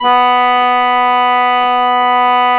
ACCORDION B3; USB Soundscan vol.63; Balkanish Traditions; AKAI

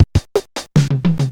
Auto Drummer

